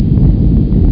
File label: rumble; roc